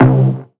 synth note soft and warm C; Virtual Riot; Synth Notes & Chords

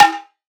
drum perc alien cowbell; Virtual Riot; Drum Hits

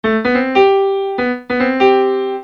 Acoustic Piano

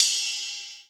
RIDE, Dance Mega Drums; Best Service; Dance Mega Drums CD2

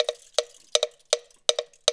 Roots Reggae; Sounds Good - Roots Reggae

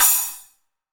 SPLASH; EdgeSounds; Drum Mashines; R-8 DRUMS

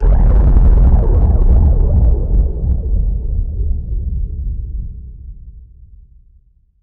NOISE, Production Effects

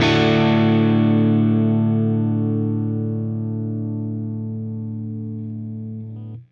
Silos Dirty Strum Guitar Ending, Indie Rock; Sonic Foundry (Sony Creative Software); Indie Rock Anthems; Session 11 - Silos

